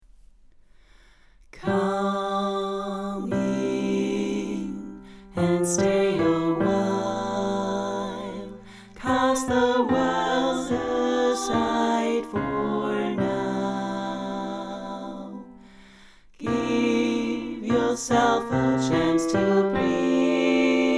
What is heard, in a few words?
Tenor come in; Singalongs